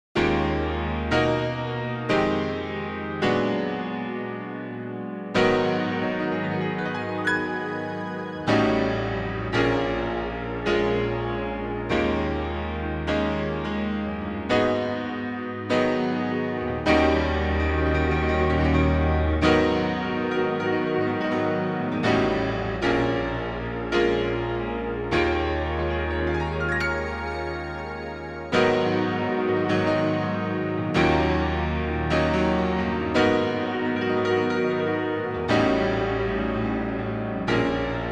Eb m, female

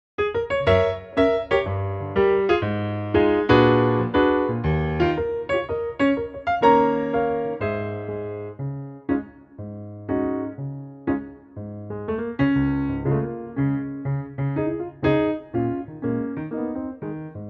Db piano

